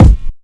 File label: Watch The Roof, Kick Drums; Zero-G; Soundclash